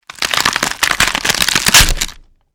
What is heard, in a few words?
body rip; easyrpg; WitchsHeart